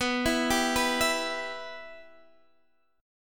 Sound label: B Suspended 4th Sharp 5th, Guitar, Standard; arpeggiated; Bsus4#5; JGuitar